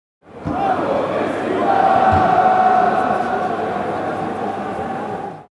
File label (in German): Stadion Fans und Gesaenge